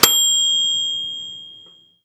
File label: ding; flixel-desktop-habit-puzzle-game